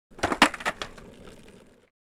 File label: Skateboard stunt bailing designed